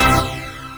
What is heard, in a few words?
lofi beaut, ravin hits; Spectrasonic Distorted Reality 1